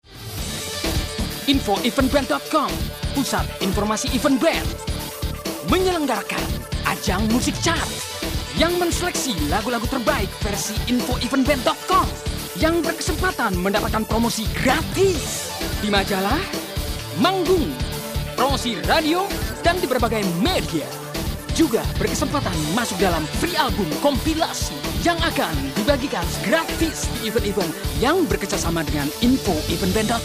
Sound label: Jingle; Ajang Music Charts